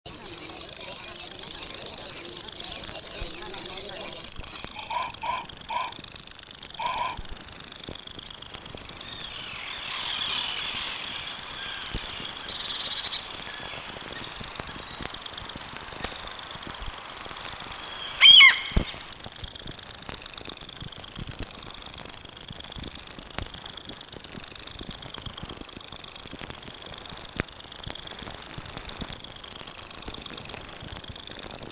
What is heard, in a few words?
WEIRD SFX 96BPM, Soundscapes; DRIFTFUL; Crowdsourced Sample Pack